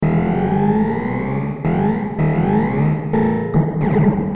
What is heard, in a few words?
clang siren